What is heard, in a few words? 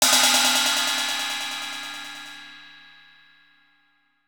ride